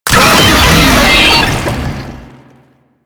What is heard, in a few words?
Brachiolyst Death, Vocals; Enemies; Warframe; Proto Infested